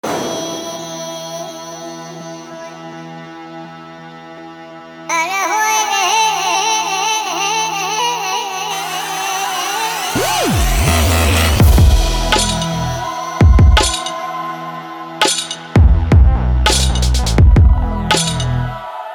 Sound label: Deutschrap Drum Kit; Vol. 2 JBG Edition